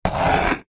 plastic item scrape concrete; zapsplat; foley

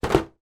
game playing board put in box tray; monopoly

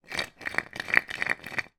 food biscuit jar custard creams shake; zapsplat

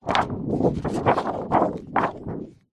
hospital xray sheet handling

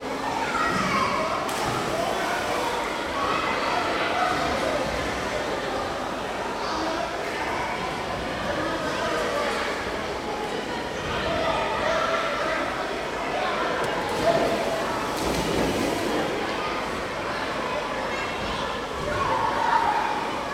public places swiming pool busy by boards

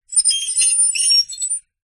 foley metal sharp scrape squeak shrill

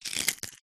foley pebbles small squeeze in hand

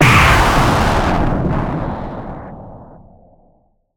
Impact Boom Distorted; bottlerocket

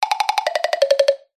cartoon wood taps knocks descending; zapsplat

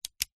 Bic Pen On

